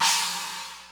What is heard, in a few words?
Yamaha RX-120 China Crash